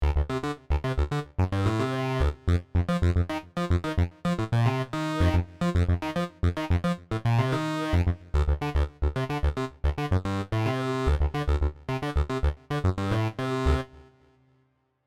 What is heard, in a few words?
Waverunner X